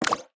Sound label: spin